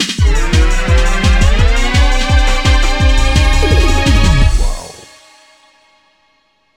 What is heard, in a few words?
Yeah Goal Jingle; Speed Racer